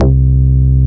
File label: BASS MONO